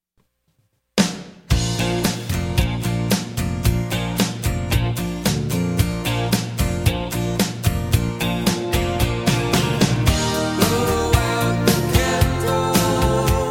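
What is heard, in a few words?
blow out the candles instrumental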